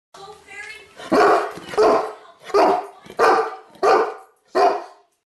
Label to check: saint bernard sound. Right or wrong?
Right